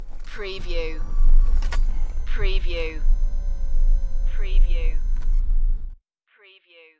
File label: Old Car Start